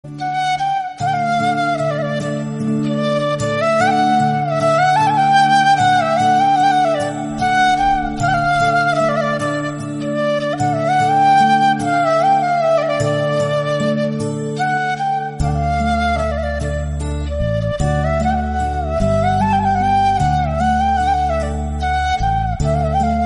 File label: South Flute Bgm